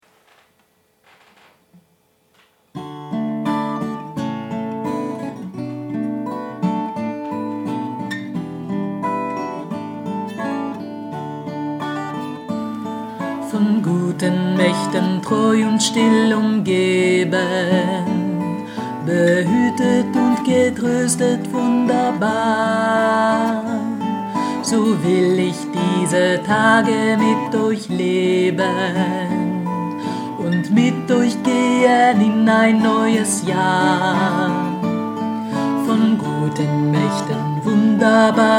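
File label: von guten mächten tenor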